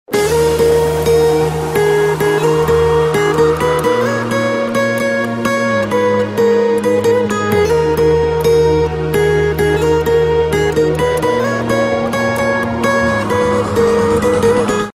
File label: Mobile Ringtone; زنگ گوشی(موبایل)عاشقانه/سامسونگ